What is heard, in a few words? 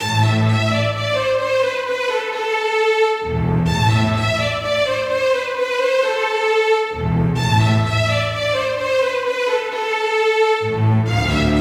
strings